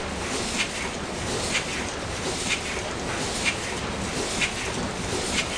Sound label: steam